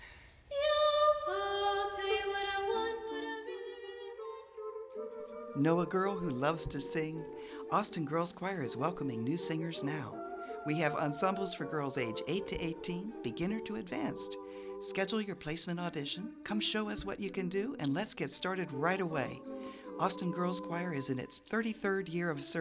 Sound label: girls choir; Austin; PSA; Community Partners